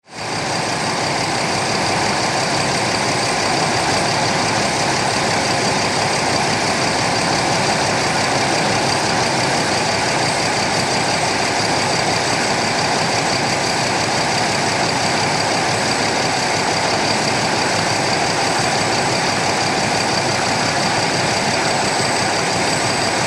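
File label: Ferry Interior Engine Room Close Up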